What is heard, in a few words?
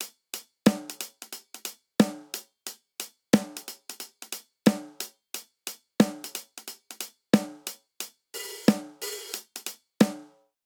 Swing Hip Hop Snare & Hihat 90 bpm; 4 4; MIDI Clips; Ableton; Factory Packs; Drum Booth